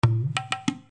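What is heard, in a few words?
baraban, drum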